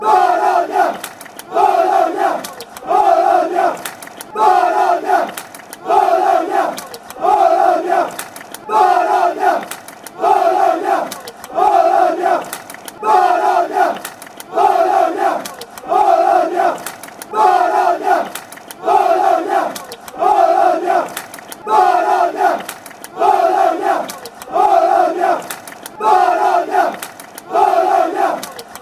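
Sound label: bologna, football chants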